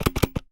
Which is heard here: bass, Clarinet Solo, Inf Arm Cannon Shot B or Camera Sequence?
Camera Sequence